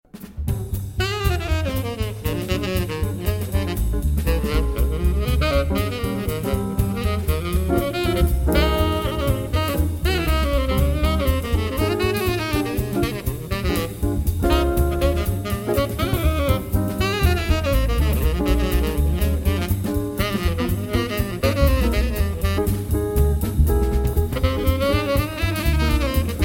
Jazz Quartet Full Mix; میکروفون استودیویی و لامپی اس ای الکترونیکس RN17 Stereo Pair

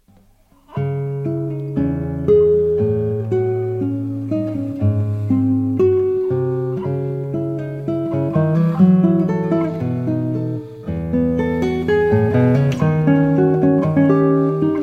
Classical Guitar

